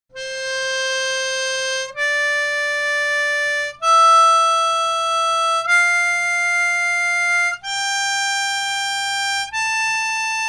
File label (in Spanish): Escala Mayor de Do completa registro medio, armonica